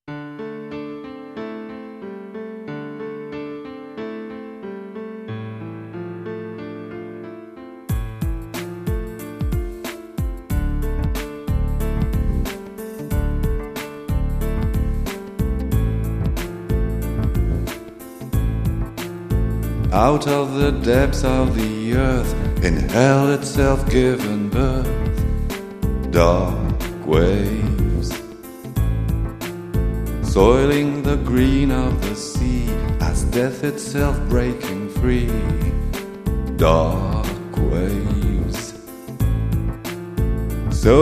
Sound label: dark waves